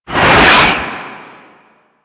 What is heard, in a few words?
Tank Cannon Projectile Whiz; Enemies; Weapons; Warframe; Lasrian